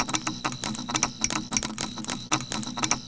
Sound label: eye flicker loop